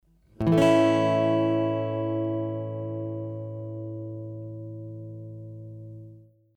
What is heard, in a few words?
A Chord Example, guitar